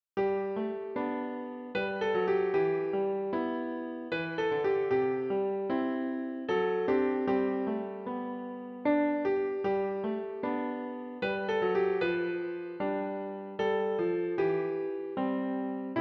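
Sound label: piano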